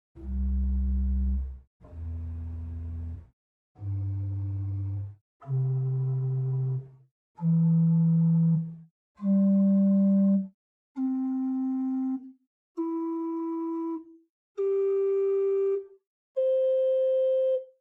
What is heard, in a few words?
Holzgedeckt 8 arp, organ; Buzard